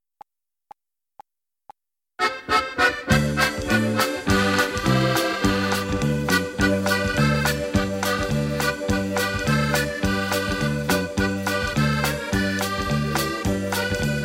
Accordion Medley, G

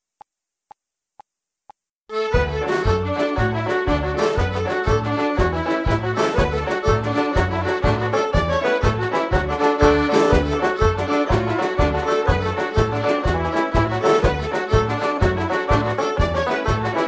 Irish Ceili D, backing track

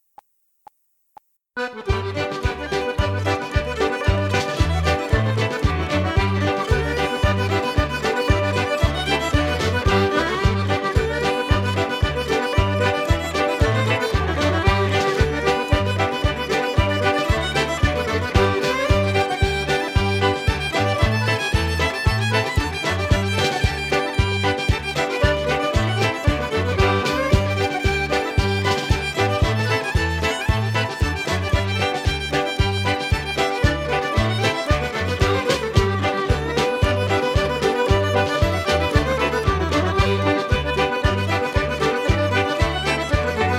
Irish Ceili Reels Medley; backing track

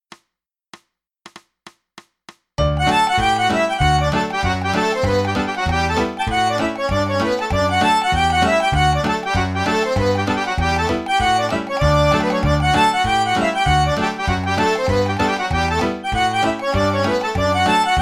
Traditional Irish Reels D

